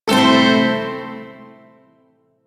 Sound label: Shawzin Pent Maj Chord K; DE; Warframe; Duviri Shawzin